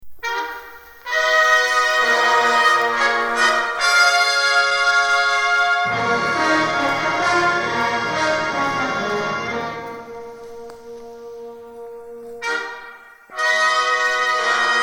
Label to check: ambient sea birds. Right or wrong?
Wrong